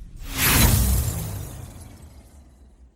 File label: dice roll fail